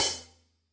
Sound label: real HiHat, REAL LIVE KITS; A Real Freakin Drum Kit